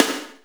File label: Snare, Akai MPC-500; Toolkits; Snare TKit